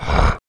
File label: jump; player